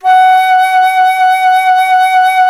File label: FLT FLTF#, Flute; Miroslav Vitous - Solo Instruments VOL-2